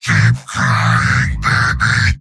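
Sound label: yell, robot; heavy; mvm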